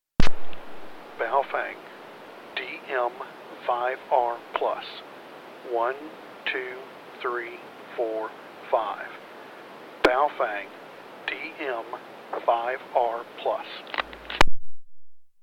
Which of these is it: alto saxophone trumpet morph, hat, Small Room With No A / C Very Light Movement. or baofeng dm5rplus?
baofeng dm5rplus